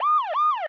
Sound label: alarm; Original TK Sounds